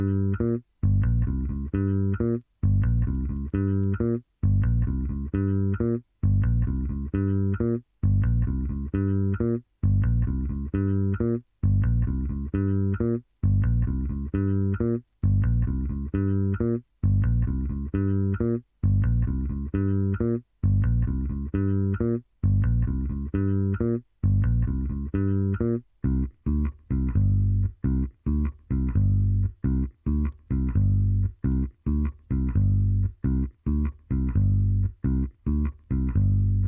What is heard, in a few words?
Electric Bass